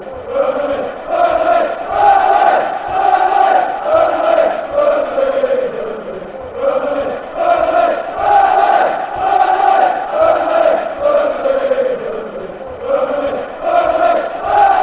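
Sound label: burnley burnley slower, football chants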